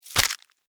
Scorpion Tail Sting, Scorpion, Tail, Stinger, Strike, Impact, Attack, Arachnid; Scorpion Sound Effects; Scorpions (Sound Effects:Animals:Insects; Blastwave